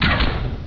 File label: flare